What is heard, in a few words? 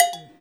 COWBELL; InVision Interactive; Percussion Slam